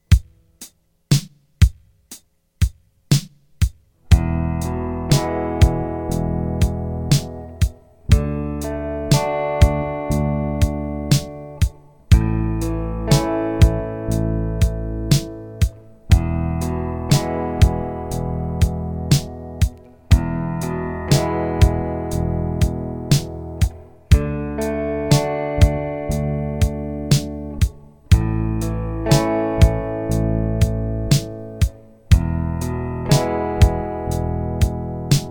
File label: E D A E Bass Bass Down